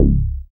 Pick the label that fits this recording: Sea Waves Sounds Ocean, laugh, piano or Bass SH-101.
Bass SH-101